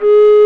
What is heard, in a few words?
RECDR; Roland - LA Composer vol. 1